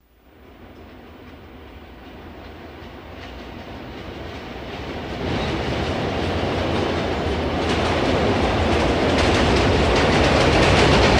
Train By More Track So, bys

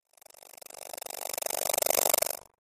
Alarm Collision, alarms

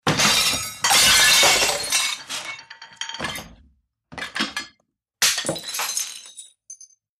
Dinner Table Turn Ove, clay & ceramic

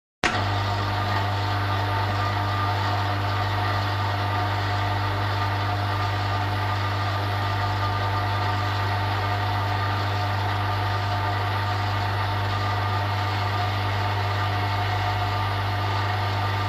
Electric Motor Light

